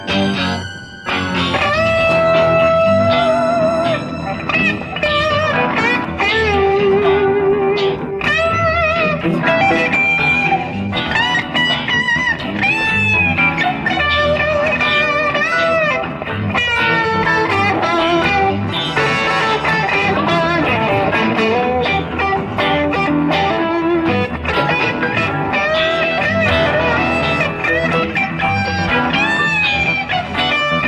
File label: guitar solo extraction